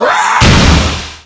Stun Wide

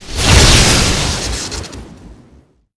Power Hit; Qickskil

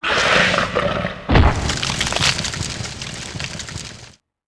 Robo Die; Seer; GamigoNA

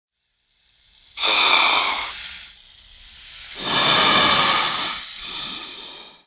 Snake In Stand; Seer; GamigoNA

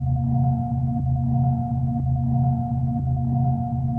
combat stand

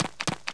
Crab Walk; Seer; GamigoNA

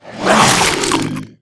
Caiman Giant Skill; Seer; GamigoNA

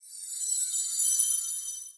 Flower

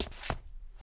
Red Shadow run

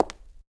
foot; Magrite

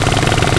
Wedding Car run; Seer; GamigoNA

